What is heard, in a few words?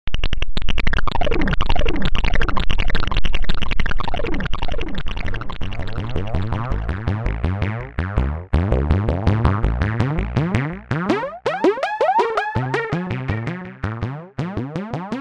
synth; SymSynth szinti szimulátor és az ezzel előállított hangok